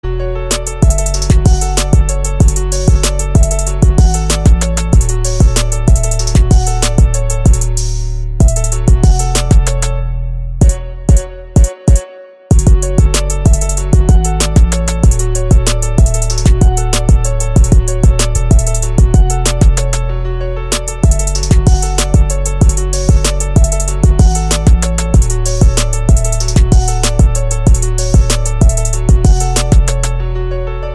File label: Instrumental